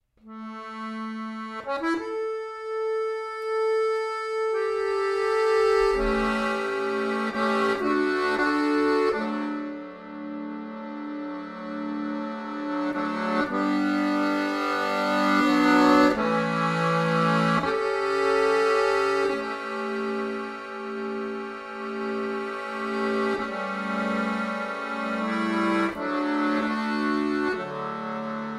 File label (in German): volksmusikstueckl